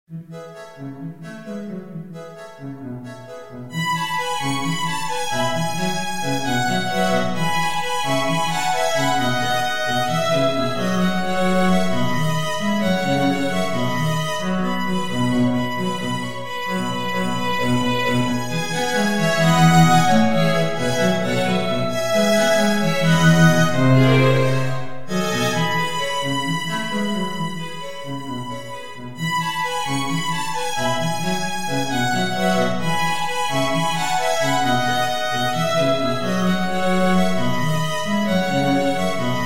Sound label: string quartet